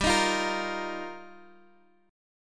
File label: G Minor 7th Sharp 5th, Guitar, Open D; chordshape; down